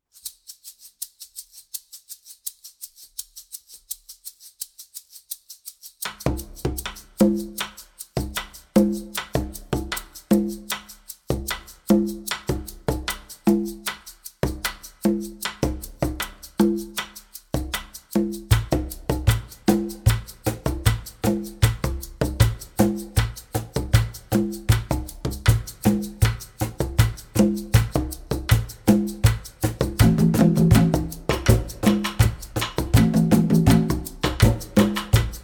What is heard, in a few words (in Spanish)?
Merengue